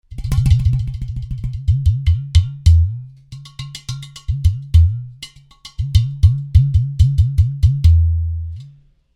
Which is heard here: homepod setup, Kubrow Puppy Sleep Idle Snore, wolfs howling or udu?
udu